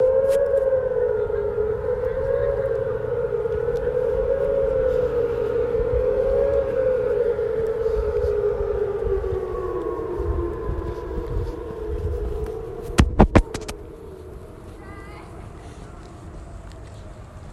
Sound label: tornado